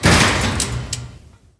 door stop

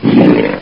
die, zgrunt

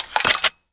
glaunch ready

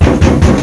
knock

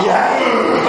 moan; chatsounds; gachi

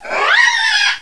alert

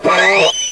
pit drone die; betapitdrone

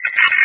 active

